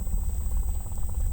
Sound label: Hydrophone